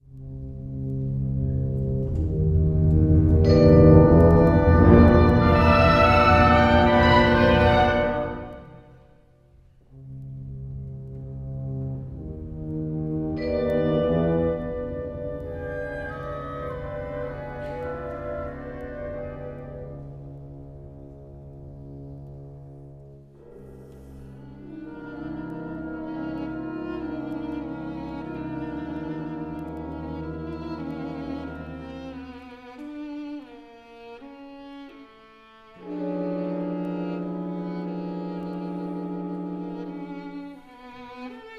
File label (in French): pour piano; RTBF